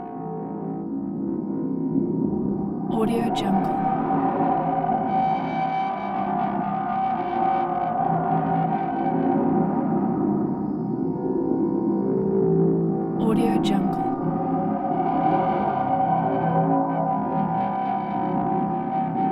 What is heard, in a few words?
shrill distorted dimension